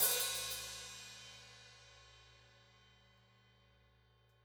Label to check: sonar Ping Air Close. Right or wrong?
Wrong